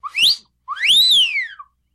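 sounds of cartoon whistle at the sight of a beauty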